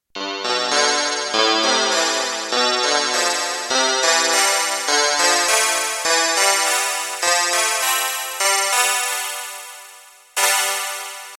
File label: Krstl Chord; Kawai K1; Bank 2 card A